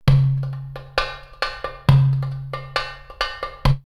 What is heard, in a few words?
Bahrain 134, drumloops World & Ethnic; X-Static Goldmine